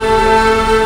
STR A0 PP L, STRNGS, Orchestra; Sound On Sound - Peter Siedleczek's Orchestra

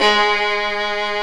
ARCO VIOLI; E-MU Formula 4000 Series Vol. 5; Protozoa; Proteus2 Instrmt

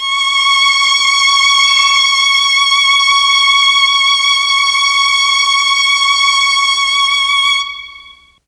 C#4 VLNS, Mellotron; CHMB 3 VLNS; Mike Pinder - Mellotron Archive CD

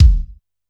B1 - BD Rock, Yamaha PSR-E323 Electronic Kit

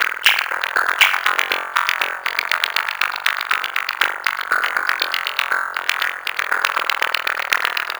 Structured Rhythm, IDM Rhythms

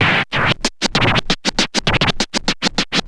scratch short, Vinyl scratches

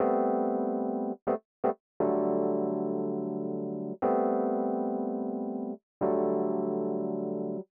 120 Rhodes A, Keys, Nu Jazz House; PowerFX

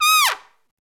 SOLO TRUMPET

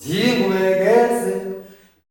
"TEE-LOWEA", MALE; Spectrasonics; Heart of Africa 2; S.MALEAMB

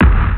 R8 DANCE KIT; Rubber Chicken Software Sample Bank; DRUMS + PERC

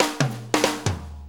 Shuffle Fill, Drum Tools

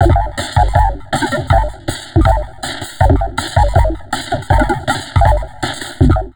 160, Drum Loops Crazy Processed

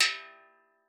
E3, STEELDRUMS; Xsample VOL-4

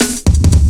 Steppa Cut, Drum Loops 169.5; Zero-G Jungle Warfare 1, 2 & 3

